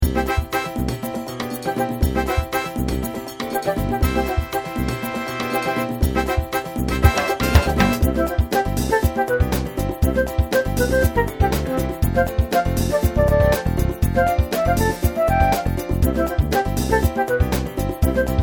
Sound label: Flute Song